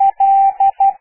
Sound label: L morse code